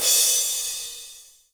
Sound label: drum hit finish; osu